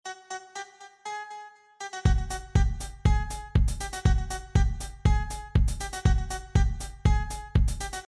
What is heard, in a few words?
Beat